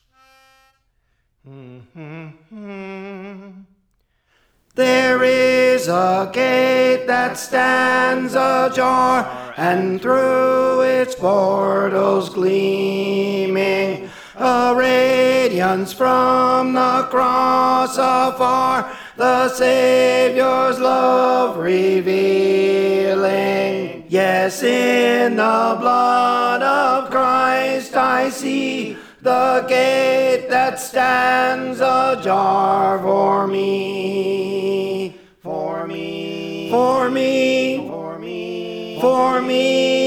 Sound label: Tenor; Praise For The Lord Training